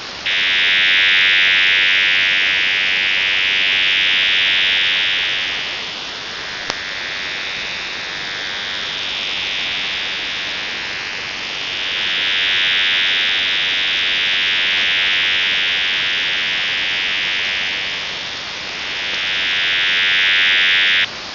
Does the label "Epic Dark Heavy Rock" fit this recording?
No